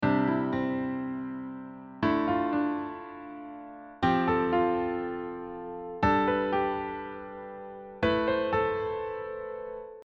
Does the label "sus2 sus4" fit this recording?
Yes